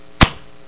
splat

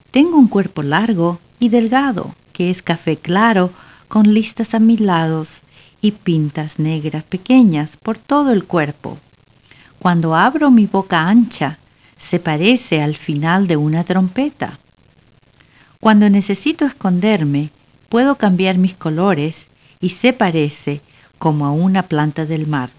Sound trump

